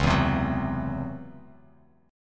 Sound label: C sharp-Minor Major 7th Double Flat 5th-C sharp, Bass Standard; C#mM7bb5; strummed; JGuitar